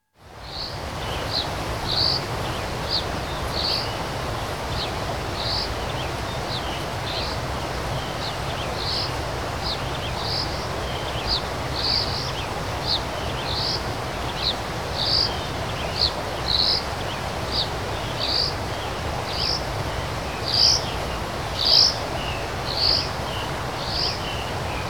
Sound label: Light Rain Falling In a Lakeside Forest; Dr. Sound Effects; 210 Nature Sounds - 20 Hours of Relaxing Natural Ambiences for Meditation and Sleep